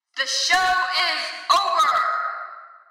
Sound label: Activated; 10 Psi Psimbals